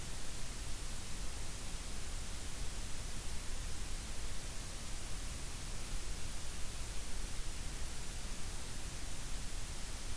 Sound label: WFR audio emfisis